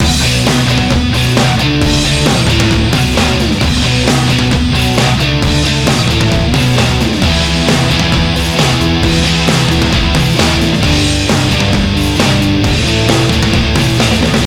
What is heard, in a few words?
Music Rock; Learn to Fly 3